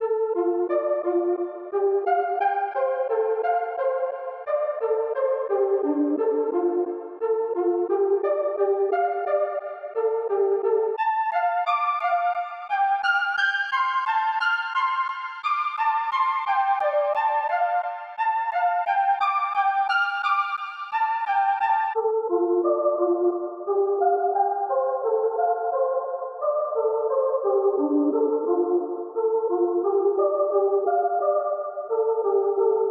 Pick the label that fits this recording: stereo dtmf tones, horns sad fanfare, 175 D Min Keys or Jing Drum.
175 D Min Keys